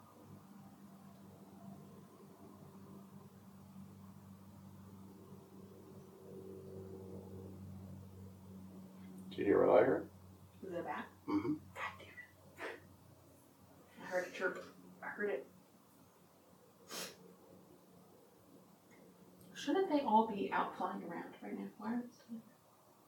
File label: Attic EVP Start of Night; greene county historical society museum return investigation